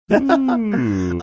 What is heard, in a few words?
"ooooh"